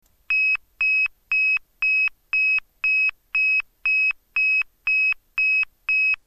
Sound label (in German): ton, Signalgeraete